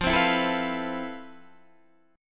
F Major Sharp 11th Guitar DADGAD, chord

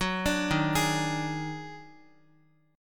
Eb Minor Major 11th, down, Guitar; arpeggiated; 4F,4A,5C,5G,5C,6E; chord; JGuitar

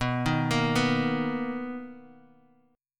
B Major 7th B down Guitar Half Step Down

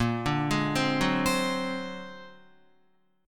A sharp Augmented 9th A sharp Guitar; A#+9 arpeggiated; JGuitar

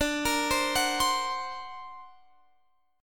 D Augmented 7th down Guitar Half Step Down

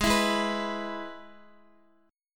Ab Minor Sharp 5th Ab down Guitar; Abm#5 strummed; JGuitar

